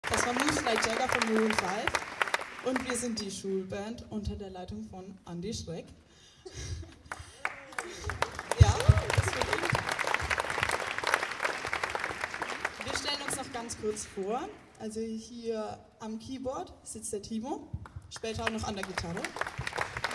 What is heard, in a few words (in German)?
Schulband; Vorstellung